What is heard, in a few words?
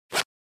Baggy pants zipper opening or closing; Clothing; Clothing Sound Effects